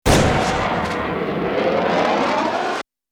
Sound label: Bullet Time; FXHome; Bullet and Gun